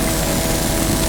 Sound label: ufo lifter; lifters